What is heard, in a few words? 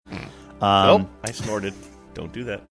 snorting saying i snorted dont do that